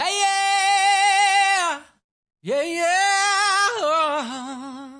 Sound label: fem hi er, African vocals sung